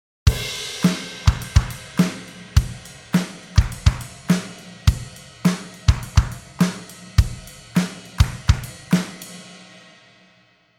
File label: Drum